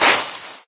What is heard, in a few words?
drop outdoor build; There; ThereClient; gamekit